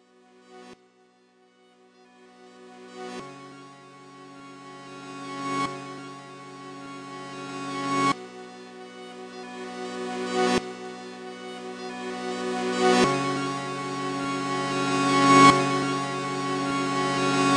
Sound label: waves, electronic